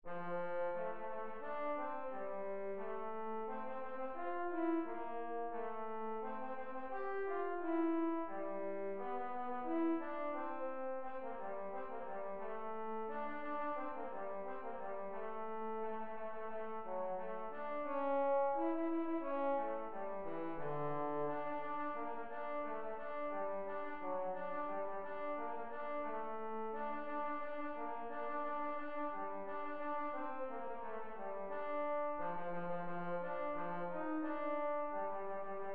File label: Trombone Excerpt